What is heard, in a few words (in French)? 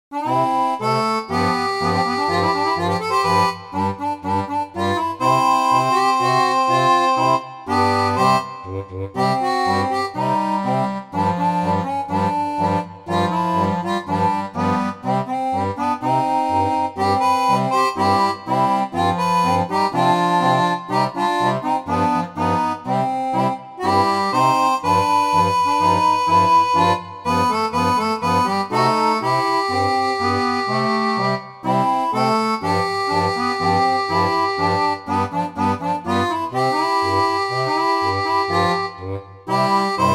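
orgue de barbarie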